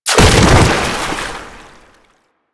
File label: Proto Infested Bodyfall Water; Enemies; Warframe